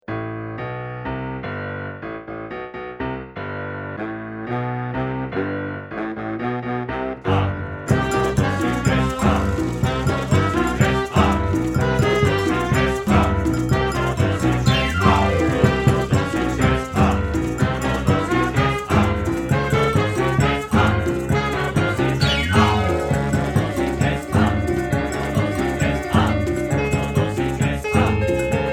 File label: conga; Requiem For A Dream